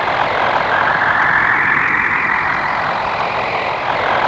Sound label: NOISE STORM; Zero-G Ambient 1; WNOISE ATMOS